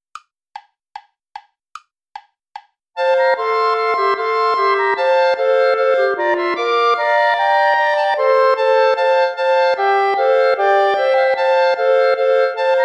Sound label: bourree play along without clarinet; praetorius michael; bourree iii